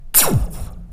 Laser Short

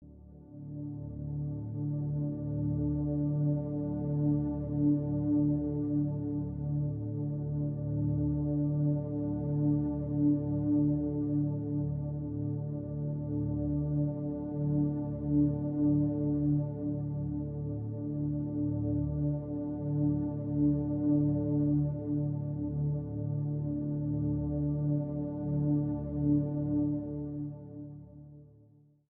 Ominous Background Melodies

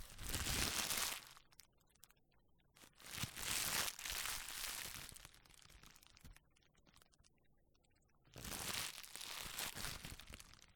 Plastic Bag

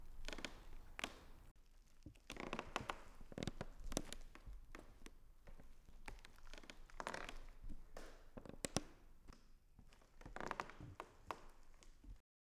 Wooden Floor Creaks

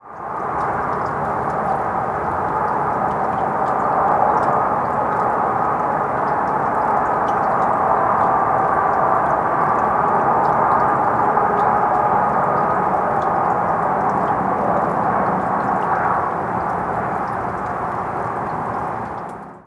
Freeway Noise

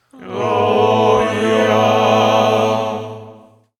Gloria Monks

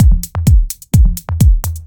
Beat

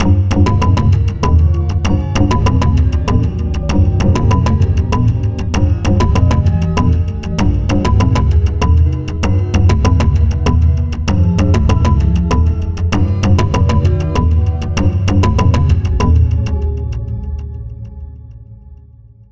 Ethnic Loop

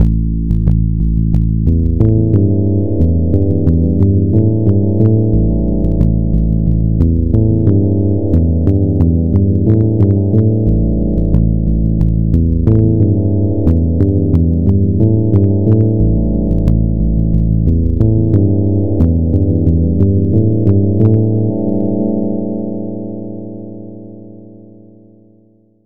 Synth Seq Mellow Bass Guitar Melody 90 Bpm

